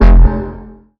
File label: Bass F, Bass Synth